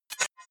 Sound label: hover fast hard; soundpool